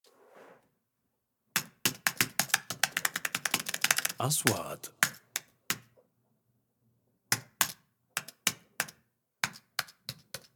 كيبورد كمبيوتر، كتابة

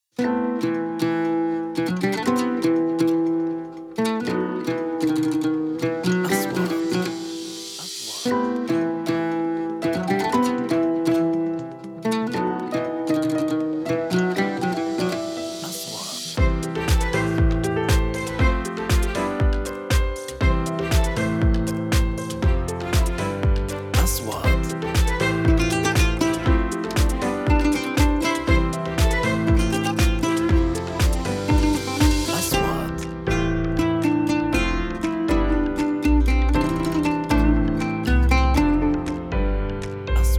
موسيقى تصويرية سياحية

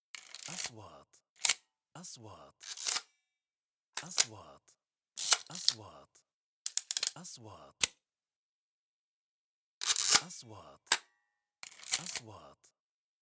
نغمة تنبيه كاميرات; transitions

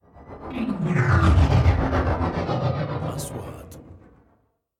إنقالة هوائية; sound effect; transitions

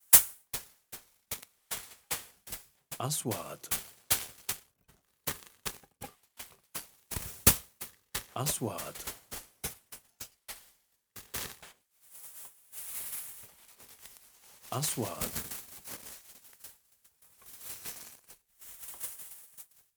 خطوات على رمال صلبة; sound effect

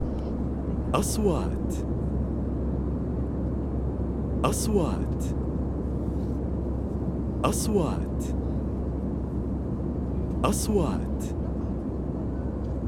الصوت داخل رحلة الطائرة, airplane sky wind

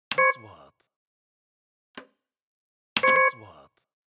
نغمة كاشير, transitions

